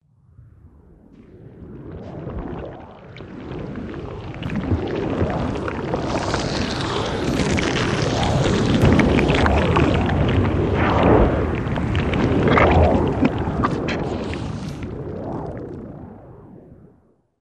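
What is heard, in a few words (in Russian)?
sticky creature in swamp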